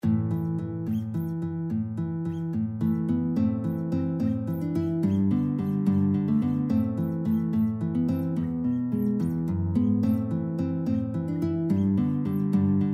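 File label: 2 guitars, guitar duet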